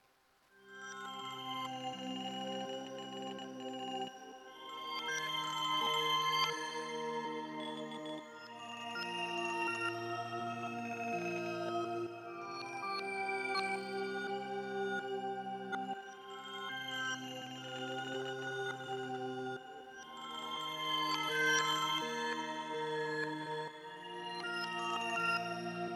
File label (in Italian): Pattern, Sonicware Liven Texture Lab